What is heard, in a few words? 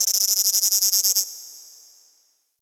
Ocean Critter Insect; Warframe; Grineer Ocean